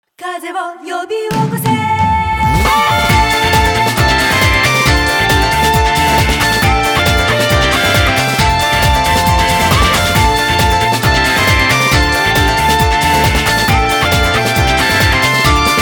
Blade of thunder (karaoke); Kaminari no Senshi Raidy I & II Collection Pack

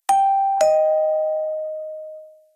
Pingpong

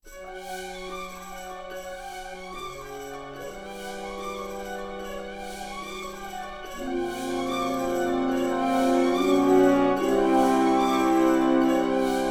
SWING INTRO; Spider-Man 2; PS2

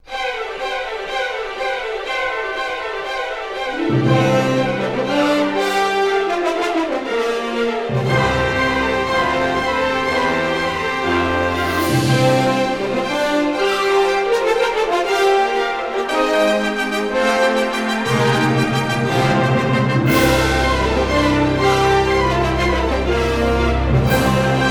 Unlock Statue; Disney Infinity

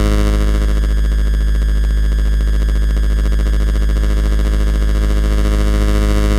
Music Hesitation Backing; gamerip; shovel knight king of cards

